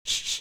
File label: Tsshh Voice